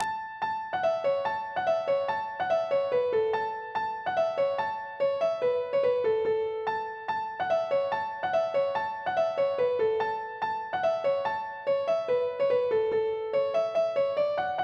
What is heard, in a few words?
polka; boeuf trad